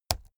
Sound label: keyboard laptop key press slow hard